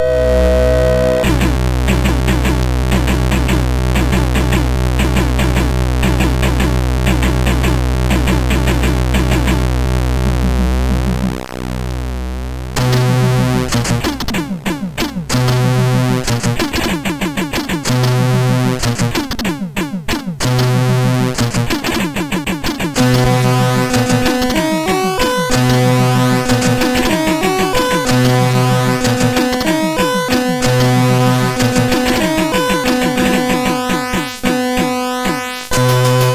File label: Train, MOS6581R4; Non JCH Newplayer; hvsc